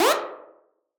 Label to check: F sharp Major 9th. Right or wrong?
Wrong